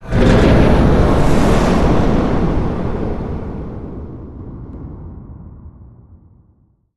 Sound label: Achievement Completed; Custom UI Sounds; Bloodborne